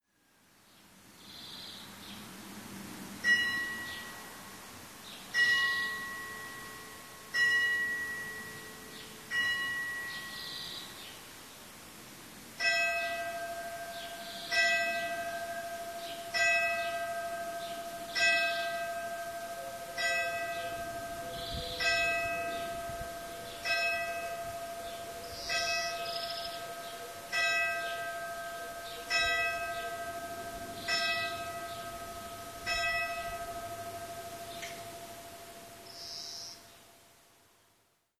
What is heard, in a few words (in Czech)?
odbijeni; dolni nemci